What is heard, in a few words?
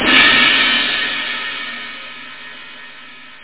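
crash, cymbals; OctaMED SoundStudio V1; jazzies samples; perc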